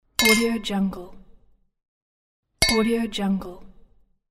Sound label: glasses champagne; archisound